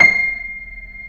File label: Keyboards Acoustic, C6; USB Soundscan vol.17; AKAI